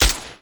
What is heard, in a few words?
loop, 12.3x50mm; Caliber